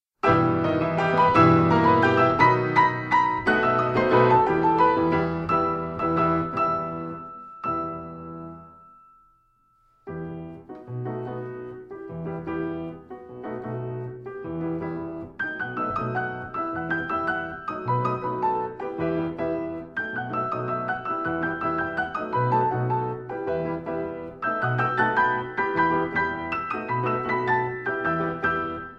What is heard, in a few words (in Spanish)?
bambuco